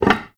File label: Vase Medium Impact